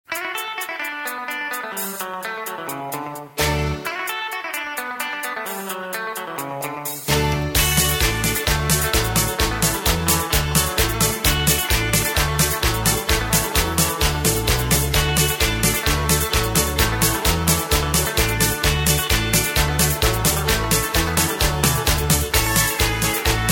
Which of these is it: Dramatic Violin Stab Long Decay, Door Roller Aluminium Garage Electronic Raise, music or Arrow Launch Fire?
music